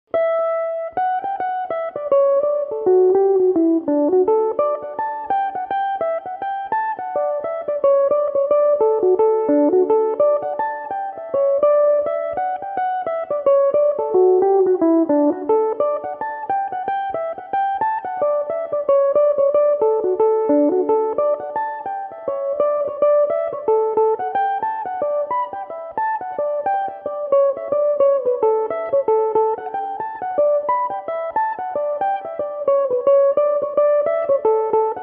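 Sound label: jig, D major, mandolin; Princess Meabh's Jig; Belmuse EM-190 electric mandolin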